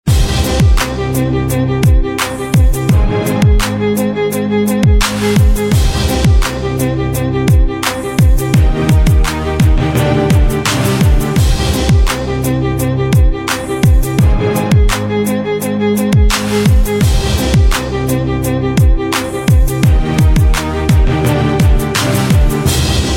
Hip Hop Beat

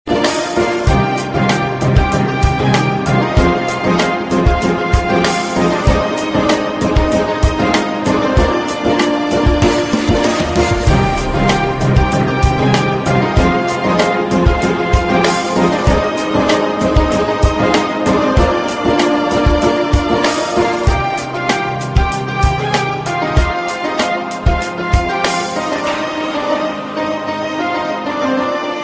Instru; Murder 2

